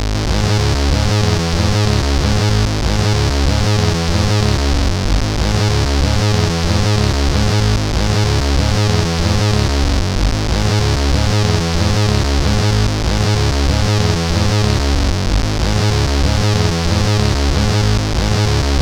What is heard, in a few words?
Big Echo, sid CSG8580R5